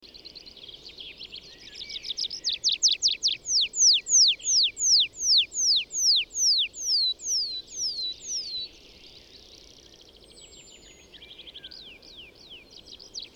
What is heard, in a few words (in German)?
Baumpieper; Bayerischer Wald REG; Susanne Rieck